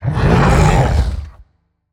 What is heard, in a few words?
Monster; Zenless Zone Zero